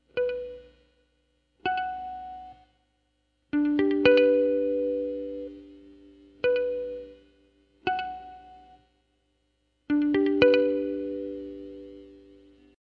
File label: harmonic